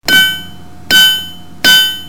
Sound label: rock